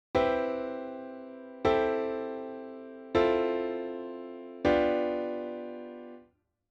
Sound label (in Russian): cepochka akkordov do lya fa sol